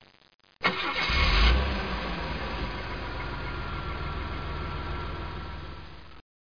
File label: car start; Broderbund Print Shop Multimedia Organizer Deluxe Edition